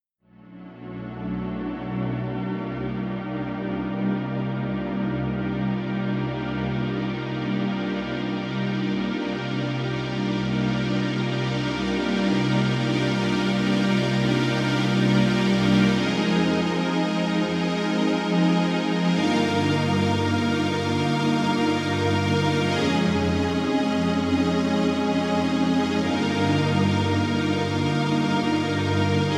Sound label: Strings 5Th; DEXIBELL COMBO J7; organo digitale